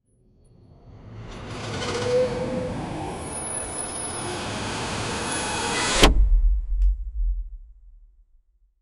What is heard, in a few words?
inter dimensional portal